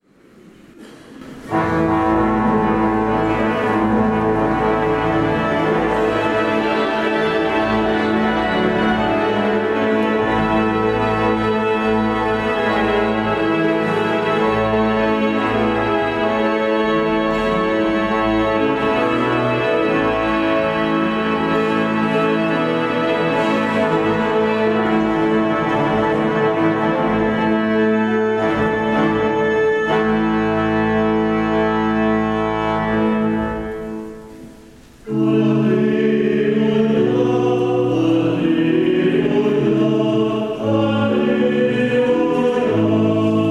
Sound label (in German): Hallelujaruf; Weihnachten 2016; 1. Weihnachtstag